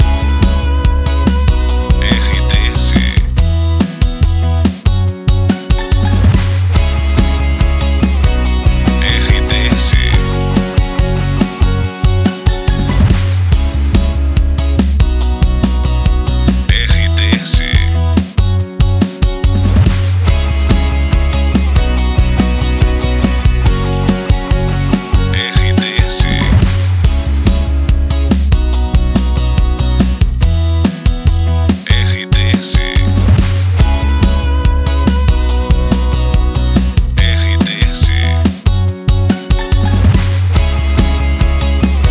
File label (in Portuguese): trilha para locução; Pacote Cidade FM, Pato Branco/PR Emissora com perfil Jovem